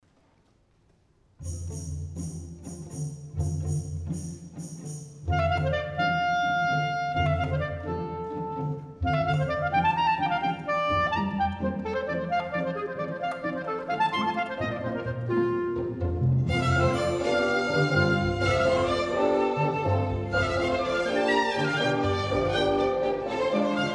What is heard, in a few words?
Clarinet Concerto Excerpt